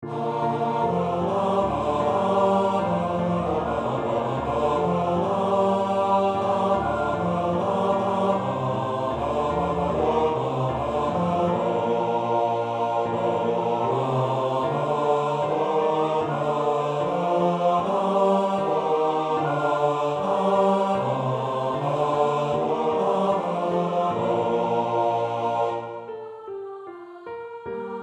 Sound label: The cherry tree carol Baritone